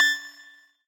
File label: Drum Kit Hit Pitched